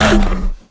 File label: chimera take hit; mutants; STALKER; customnpcs